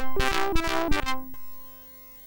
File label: Clik Clak, MOS6581R3